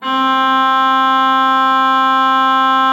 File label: C4, Cathedral Organ, HOLZPRINZIP; Propeller Island